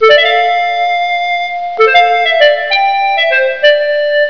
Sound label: MELODY; psion cd vol1